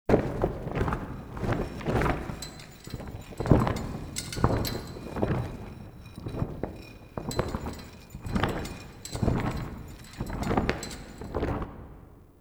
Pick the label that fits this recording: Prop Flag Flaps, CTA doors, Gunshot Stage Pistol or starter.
Prop Flag Flaps